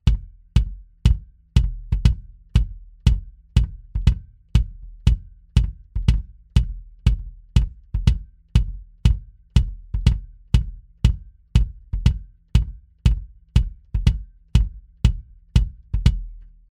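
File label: sE Electronics V Kick Mitte Classic Modern, kick drum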